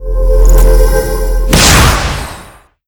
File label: mage skill